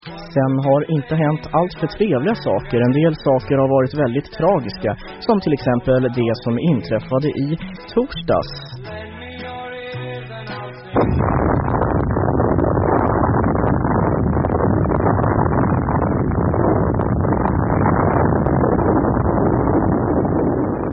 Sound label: crash